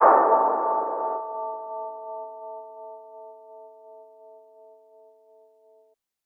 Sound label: Hits